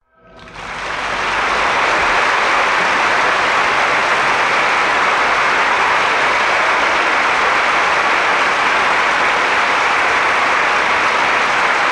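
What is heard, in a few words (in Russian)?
aplodismenti v teatre, applause in the theatre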